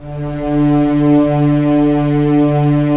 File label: STR, Roland LA Composer